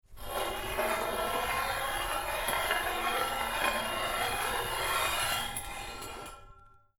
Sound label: Metal Grind Scrape Large Rock Along Metal Bar; Long metal scrape sound made by dragging a large stone; Metal Sound Effects